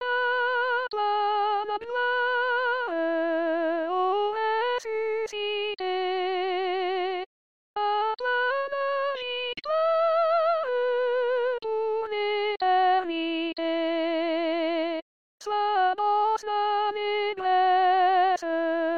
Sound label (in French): a toi la gloire soprano